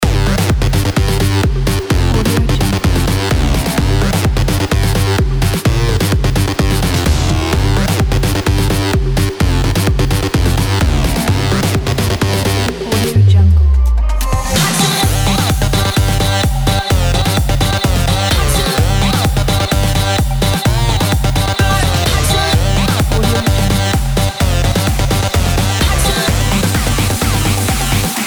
edm dance party

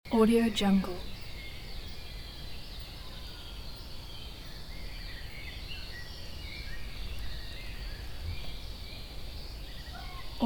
countryside spring dusk ambience loop

